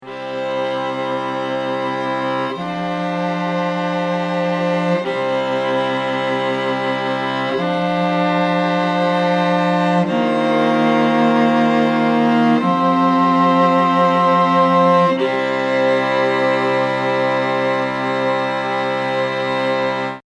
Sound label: radio jingle